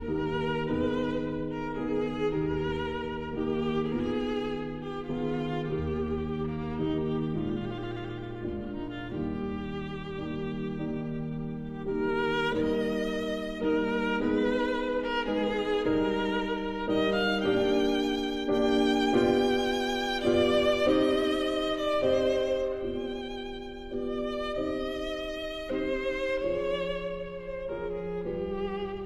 Sicilienne for violin and piano

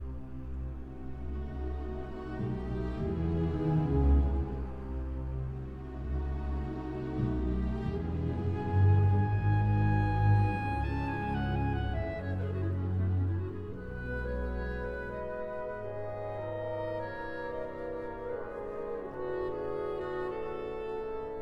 Piano Concerto in B minor Tranquillo e calmo; Mvmt 2

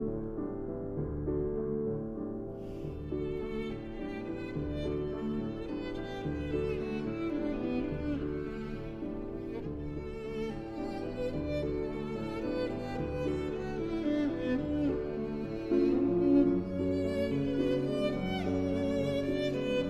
Violin and Piano in D; Faure, Gabriel; Berceuse; Opus 16

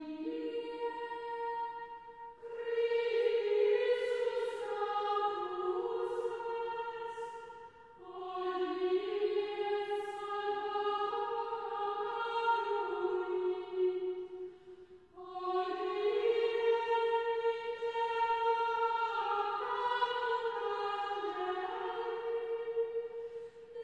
Chant Antiphon; Traditional; Chester Processional

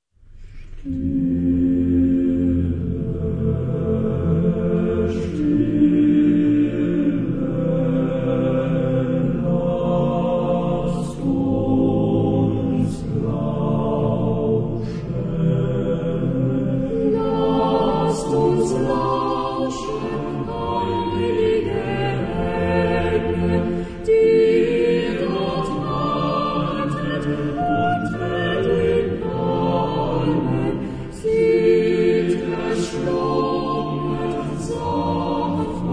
Boys Choir; Dresden Boys Choir; Stille stille, lasst uns Lauschen

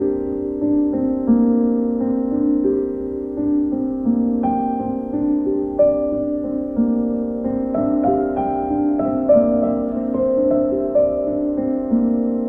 piano

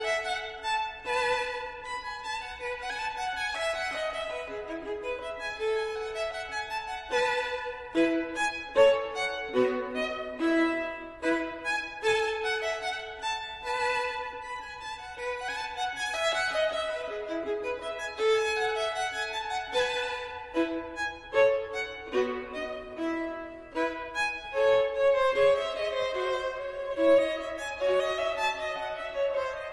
Gavotte en rondeau, Violin

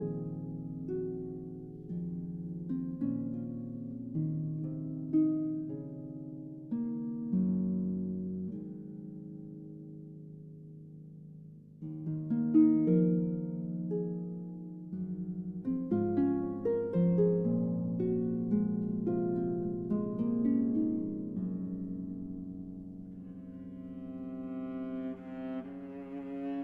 Harp Concerto Lullaby

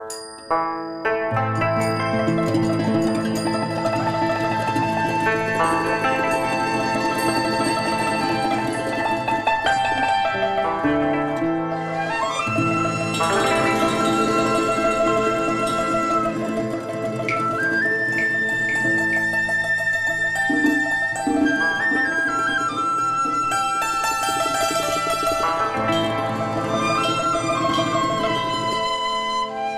TRADITIONAL Chinese, Picking Flowers

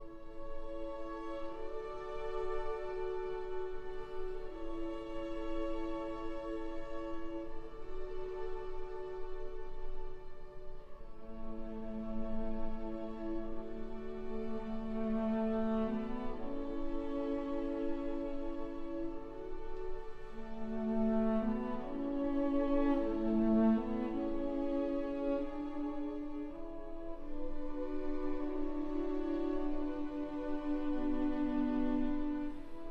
for cello and strings

